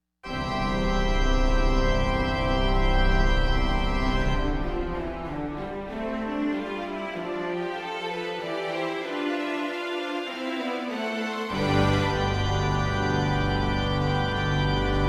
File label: Kurzweil K2600, Organ Symphony Nr 3 molto allegro; Saint-saens